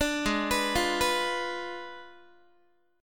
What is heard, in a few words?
G sharp Diminished 7th, Guitar; G#dim7 Chord